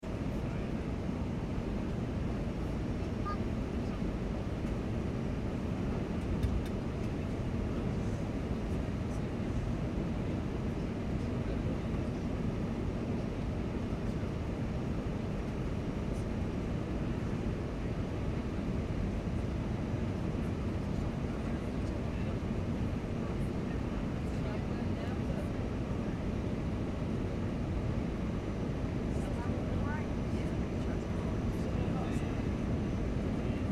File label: transportation aircraft commercial airbus landing internal, Internal Landing Ambience; Aircraft Interior Landing Ambience - Aircraft Makes Final Approach And Lands On Runway; Vehicles; Airplanes; McKinneySound